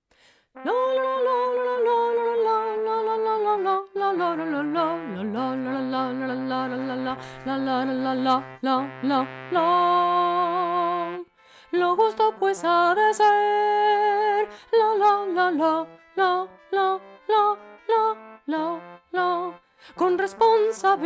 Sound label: bass